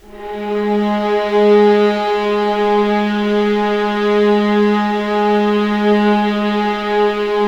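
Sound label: G#1 legato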